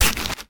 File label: horror stab knife twist remove; sound effects one